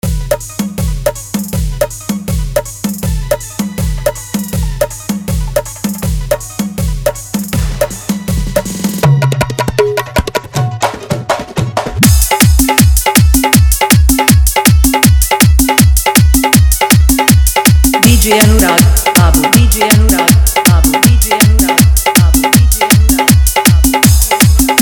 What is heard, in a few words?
Dhollki Bass Mix